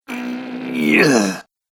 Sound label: Straining Male Strength, Recorded Voice Sound Effects